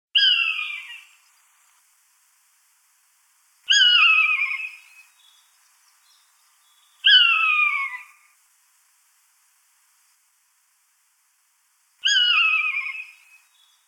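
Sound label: obyknovennyy kanyuk golos, common buzzard voice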